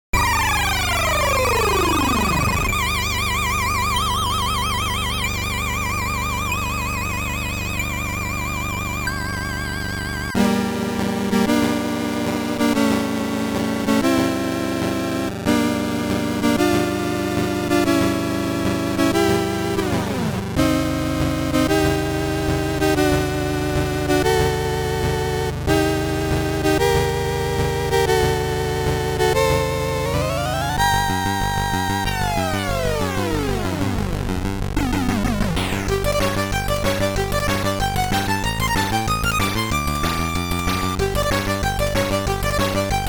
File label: c64 emulator; monty on the run